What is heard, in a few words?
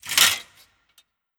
Armour